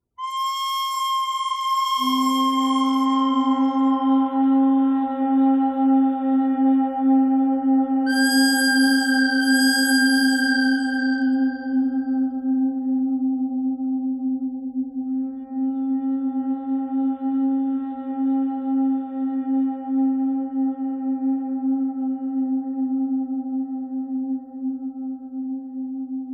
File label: bird thread pentatonic; noreverb